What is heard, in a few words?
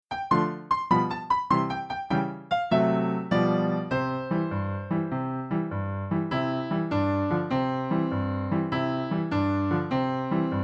three blind mice instr